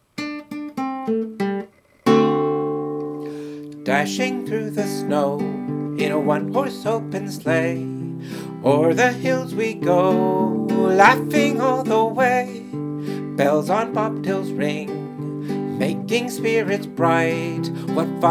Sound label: Jingle, Sing